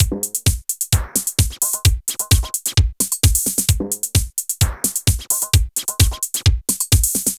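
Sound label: drum loops, right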